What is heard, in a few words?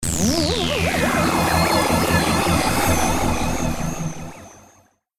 black hole; steamcommunity; saliengame